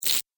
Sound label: Arcade Game Rippled Swipe; Video Game Sound Effects; Retro Inspired; Nintendo, Atari, C64